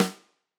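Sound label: Tam Snare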